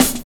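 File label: SN, snare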